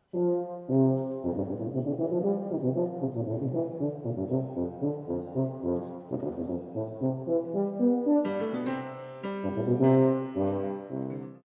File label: Tuba Piano